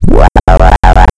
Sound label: Sound FX Acid Scratch; Alienistcog